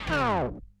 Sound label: Turntablism; Sonokinetic - The Roots Drumloops